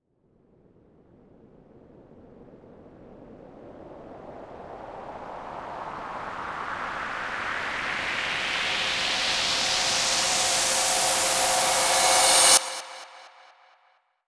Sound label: FX Uplifter